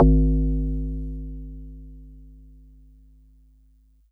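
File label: Marimba DX100 G#1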